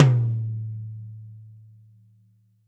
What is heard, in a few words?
large room tom drum a key